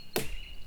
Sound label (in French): Baton Sec, PercuBois